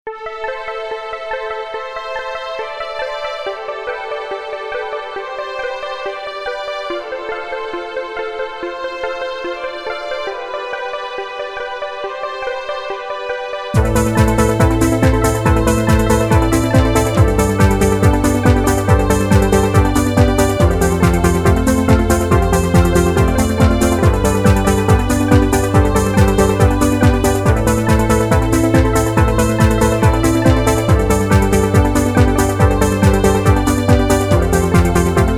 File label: Trance